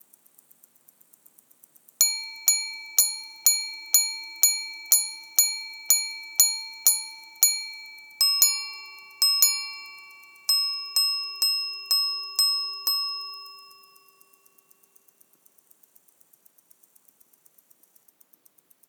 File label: Chopard L.U.C Full Strike striking 12h36